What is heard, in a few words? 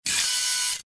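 robot quickly turns sound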